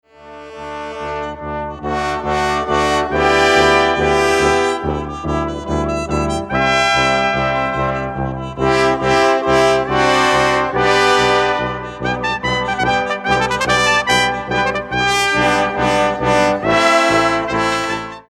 Fanfare

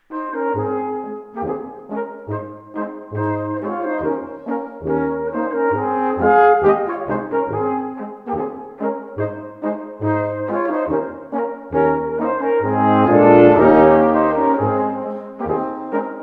Polka

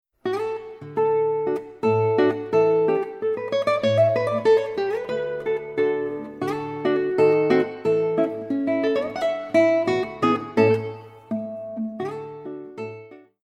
Polka

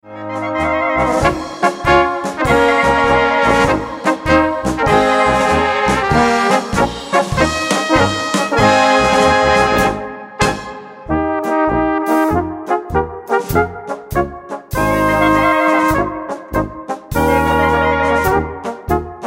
Polka, Blaskapelle

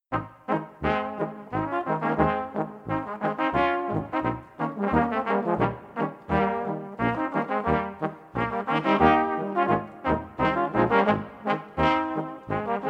Boarisch

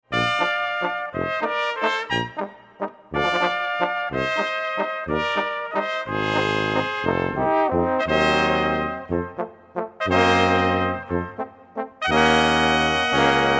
Walzer

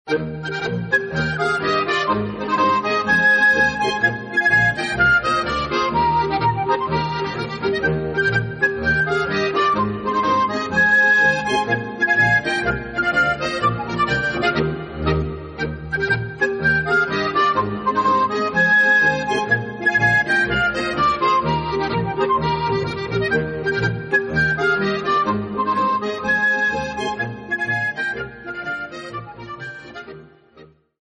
Galopp

